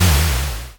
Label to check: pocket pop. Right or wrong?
Right